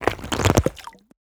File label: ice crack break water; Bluezone Corporation